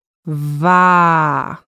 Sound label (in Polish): waaa